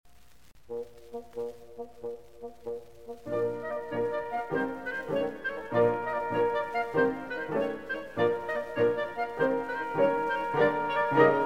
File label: quintet; rimsky-korsakov